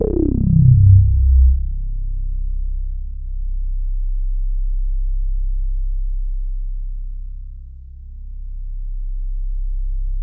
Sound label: FUNK; CMP - Analogon